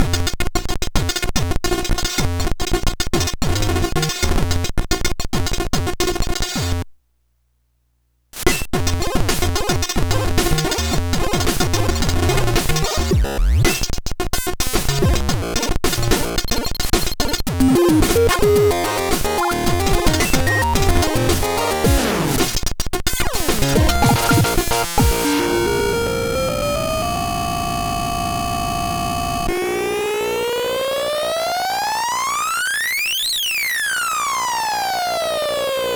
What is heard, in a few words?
sndtest dsl lameboy; gbsoundcomparison